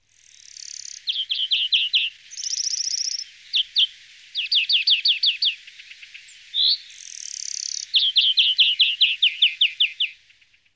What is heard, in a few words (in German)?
der gruenfink stimme; So klingt der Grünfink